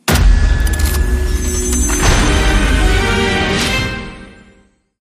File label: free Spin Won; hiddencoinsofegypt